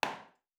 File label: Nail; Fantasy Sounds Bundle; Craft